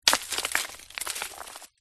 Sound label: rock small roll down pile edge; foley